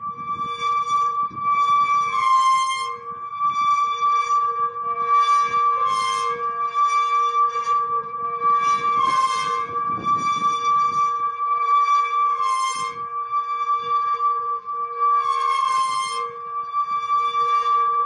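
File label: horror creak; zvuk khorror skripa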